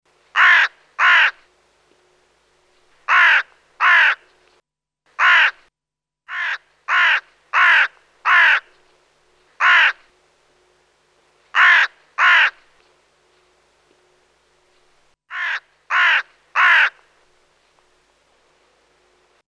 nebelkraehe

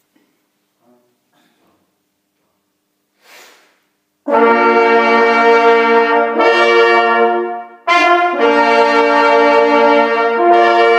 Jagd vorbei Halali

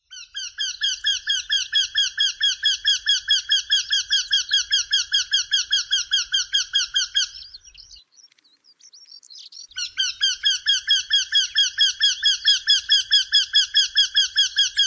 Wendehals

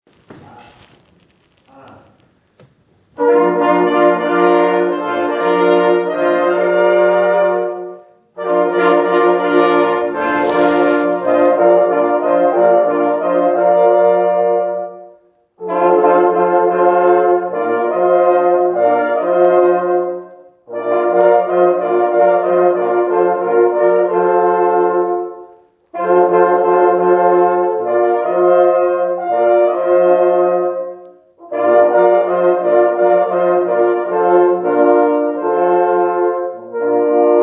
halali

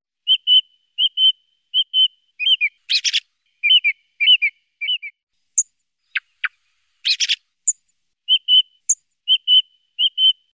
singdrossel

